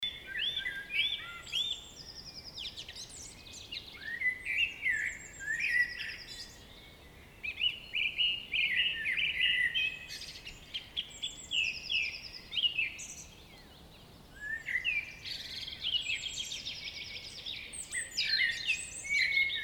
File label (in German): diverse Vögel Gorinsee